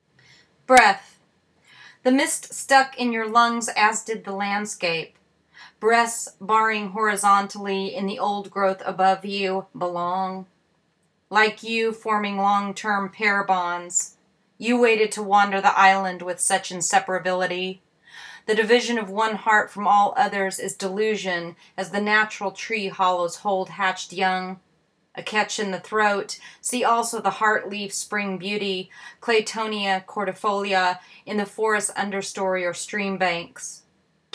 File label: breath